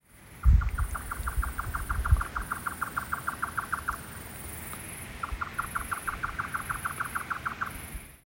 yotaka, bird song

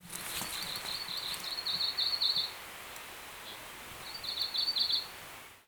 mebosomusikui, bird song; メボソムシクイ; さえずり③; 長野県北安曇郡 白馬栂池; J-eco 鳥のさえずり図鑑

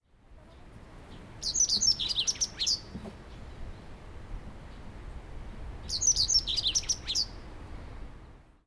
segurosekirei, bird song; さえずり; 新潟県阿賀野市畑江 五頭山ふもと

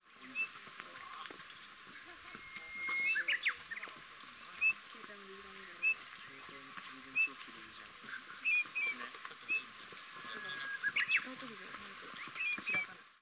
uso, bird song

